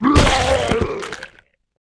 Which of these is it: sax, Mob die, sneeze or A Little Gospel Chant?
Mob die